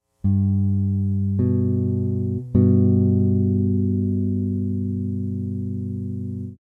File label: Major Third, bass; chords and double stops on bass